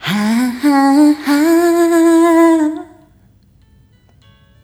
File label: Rhythmic A